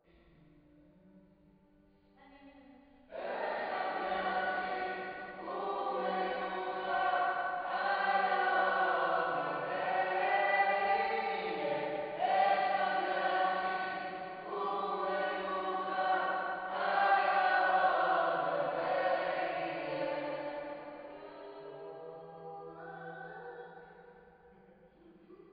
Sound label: Choir